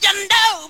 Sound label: you know SUNG